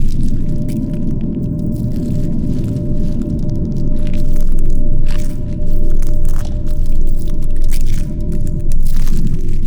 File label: pro ship colony idle; biogenesis